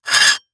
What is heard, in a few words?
Foley Metal Scrape On Cement Fast Short